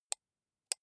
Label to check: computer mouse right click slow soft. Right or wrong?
Right